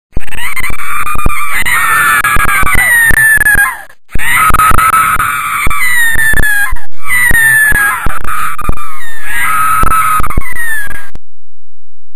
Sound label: scream females glitched